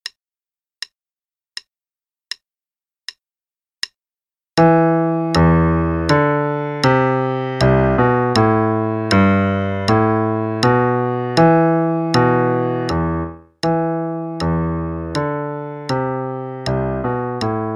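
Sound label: Play-along, Hornpipe, left hand, quarter note=80; Purcell - Hornpipe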